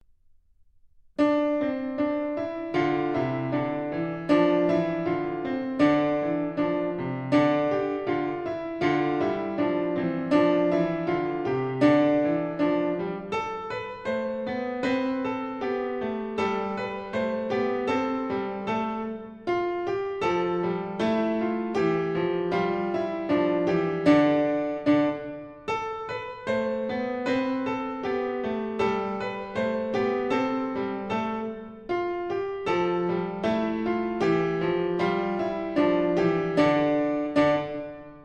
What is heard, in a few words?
Dance in Canon Form, Piano; Book 1; Mikrokosmos, Sz. 107; Bartók; Complete Solo Piano Works